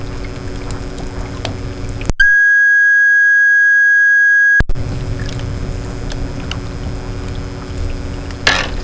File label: test background, hydrophones